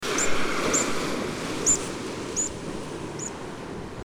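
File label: eurasian rock pipit littoralis, birds in flight; kennemerduinen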